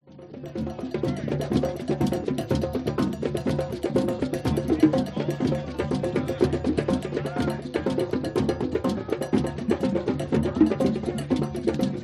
drum circle percussion bongos USA Los Angeles Venice